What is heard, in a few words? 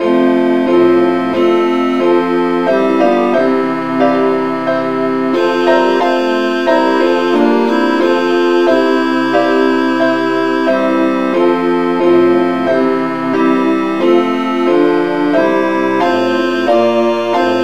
satb key f